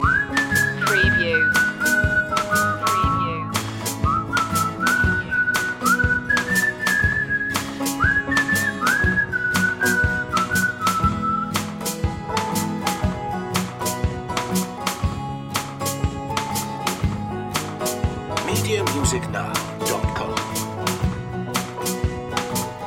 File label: whistling